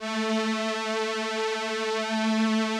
VP-330, String Machines

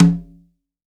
CONGA; STEREO CONGA; Cuba

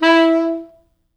E3, Pop Brass; Best Service ProSamples vol.19; AKAI

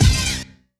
G3, synth line; 90 s Sample CDs; NiceBeats; SynthLine VOL-3

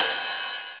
Ride, Club Techno Percussion Cymbal; Zero-G

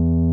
RHODES E1; Sonic Reality - Vintage Timetraveler

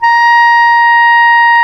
WND CLAR2, Clar Jazz

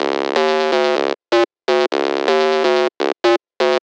Dirty Bass; Sonic Foundry (Sony Creative Software); Underground UK House & Electro

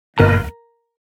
Single Hit

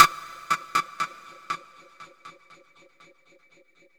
Echo Clacker; Sonic Foundry (Sony Creative Software) - Futurist Drum & Bass; Drums & Percussion

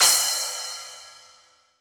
Splash Cym; Roland BK-5

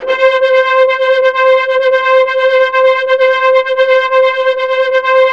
C5, SYNTHS; House Side Of 2 Step; USB Soundscan vol.51; AKAI

